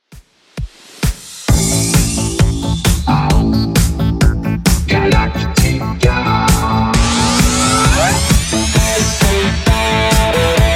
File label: 80s Redrum 132bpm